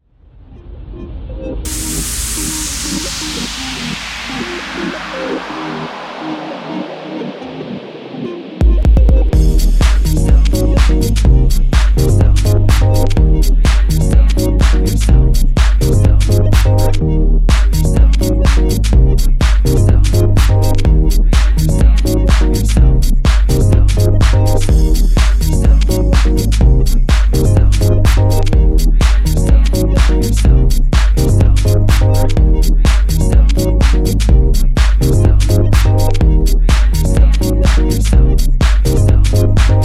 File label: Remix Instrumental